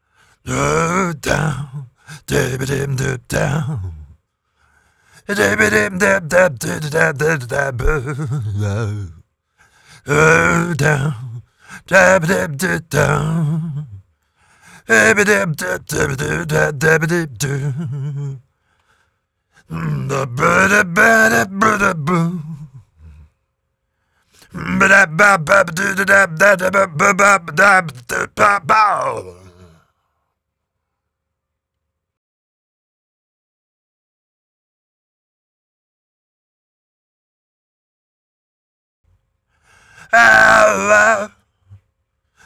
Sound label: Gypsy Jazz